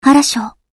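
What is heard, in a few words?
attack; hibiki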